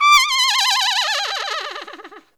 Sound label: WHINNEY, TRUMPET FX; Zero-G; Phantom Horns